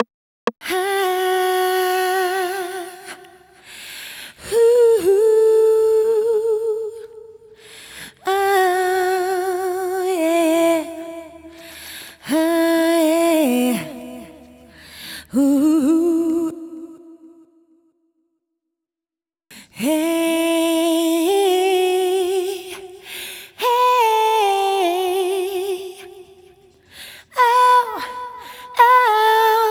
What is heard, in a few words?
Ad-Lib FX, 127BPM; Vengeance Samples